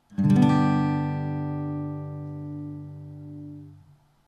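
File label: G, Acoustic Guitar chord; Acoustic Guitar Neck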